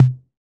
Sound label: 606 Tom